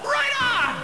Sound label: pain; baseq2; turtle